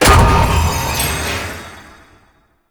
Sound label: Beam Start; Grn Forest Ballas; Veilbreaker; Grineer Forest Thumper; Kahl